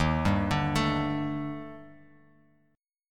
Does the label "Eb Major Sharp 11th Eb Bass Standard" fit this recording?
Yes